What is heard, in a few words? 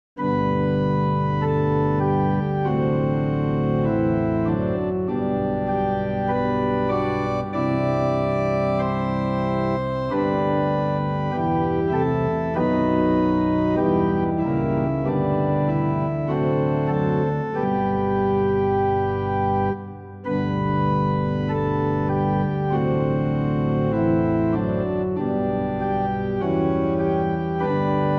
Pitch 440 Temperament Equal, Amen; hymn